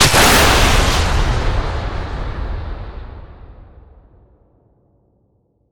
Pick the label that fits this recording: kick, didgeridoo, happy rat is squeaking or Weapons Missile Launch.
Weapons Missile Launch